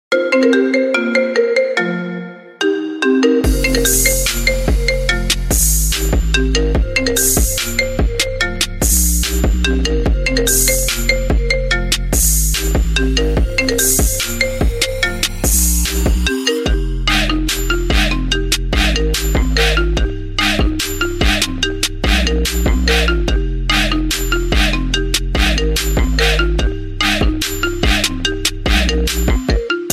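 marimba trap remix pumping ringtone for your mobile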